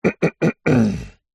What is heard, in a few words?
human male cough clear throat